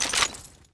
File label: gewehr reload clip in, weapons; dod 1.3; dod enhancement pack